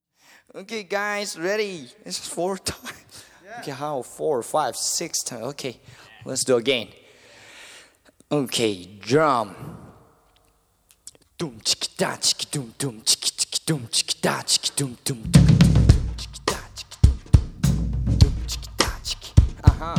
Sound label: jazzy for hiphop Live